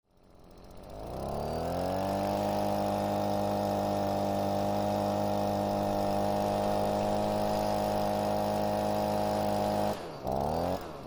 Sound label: Leaf Blower